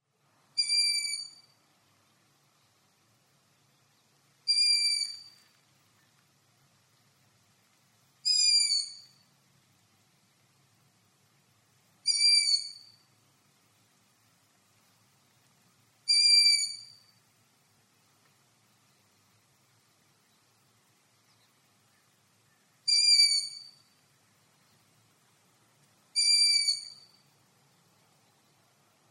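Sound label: long-eared owl voice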